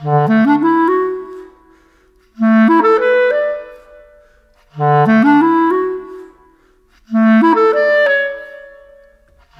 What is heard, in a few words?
Clarinet; Sonic Foundry (Sony Creative Software); Orchestral Series VOL-4; Rock and Pop; Rock-Pop 05